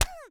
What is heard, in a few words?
goblin hurt